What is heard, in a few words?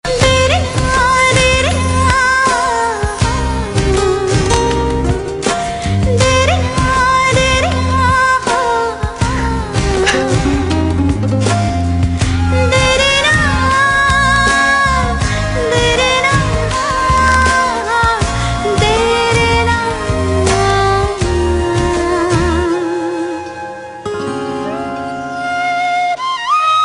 Female